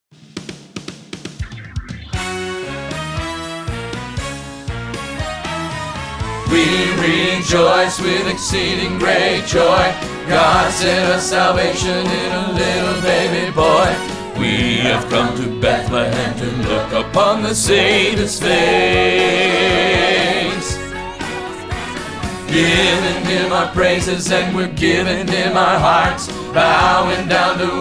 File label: reprise bass rehearsal track